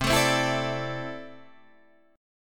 C 6th Add 9th C down Guitar Open D

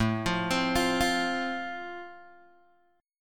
A Minor Sharp 5th A Guitar DADGAD, chord

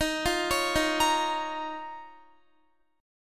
D sharp 7th Suspended 2nd, Guitar; D#7sus2; arpeggiated

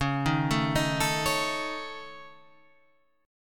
C sharp Suspended 2nd Sharp 5th C sharp, chord

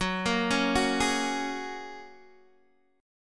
F sharp Major Add 9th, Guitar DADGAD

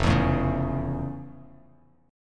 F Augmented Major 7th, Bass Standard; F+M7 strummed; 1,7,7,6; JGuitar

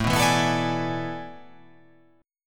A Major 7th Suspended 2nd Suspended 4th

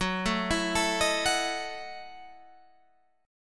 Gb Minor 13th Gb down Guitar Open G

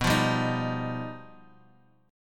G Augmented 7th

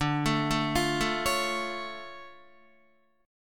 D Minor Major 7th D down Guitar; DmM7 arpeggiated; JGuitar

